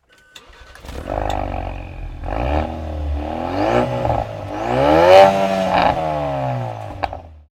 Focus RS MK3

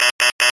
Buzz In

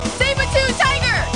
Morph; Mmpr

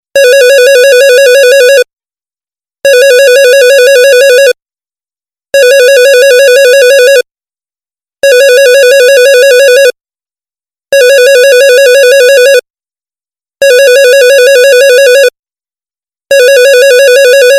Office Phone

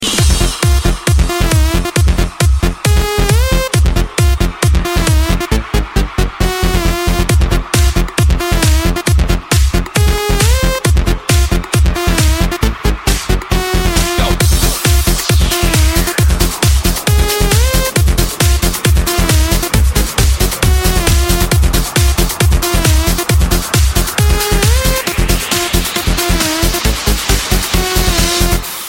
Disco

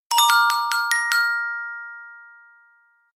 Cheerful Chimes

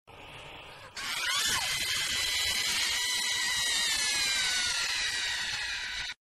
Horror

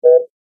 Notifcation; Durarara

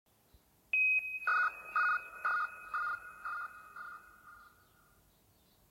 Rocket; Sky Guide

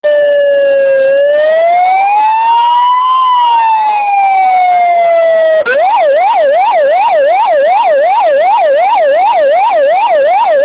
Police

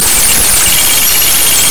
Music

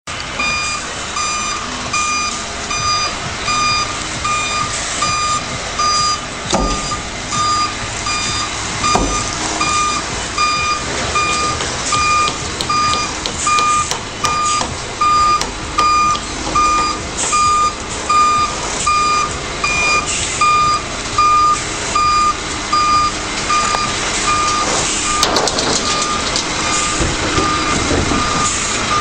Reverse Lorry

